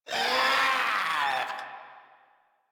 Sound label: Infested Runner Attack; Warframe; Runners